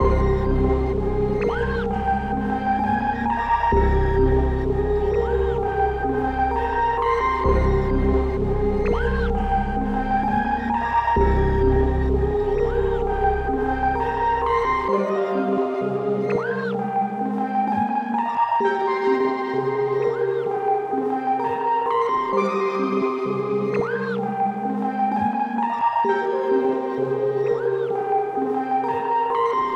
129 BPM C Min, Melody, Trap; Cymatics; 2022 Melody Collection; Trap Pt 2

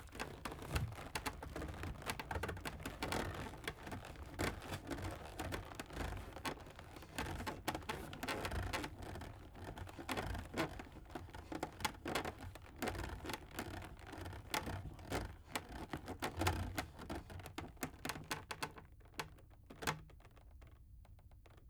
Wooden Wagon Creaks; Fairlight Sound Lib

